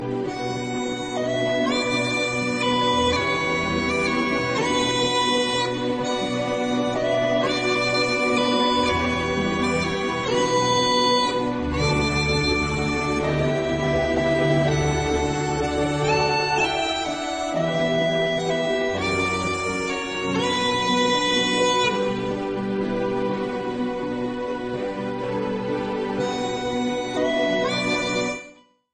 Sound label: Celtic Christmas